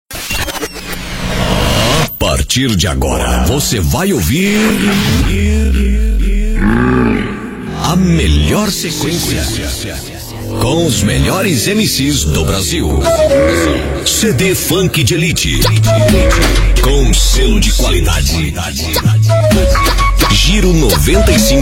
Funk de Elite

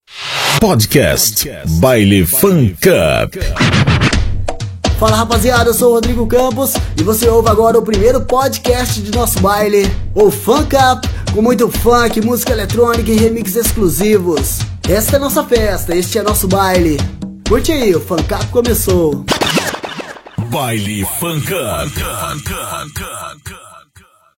Baile Funk; Baile FunkUp

